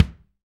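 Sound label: bass drum, Real Kit; 90 s Sample CDs; Sound & Vision - Gigapack I CD 1; Real-Kit s 4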